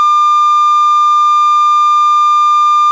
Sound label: 16KHz harmonics issue tested with 1200Hz sine tone